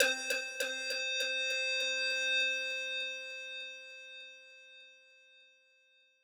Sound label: Fill Synthbell Single C; exciplex sample presets pack; JinoBeats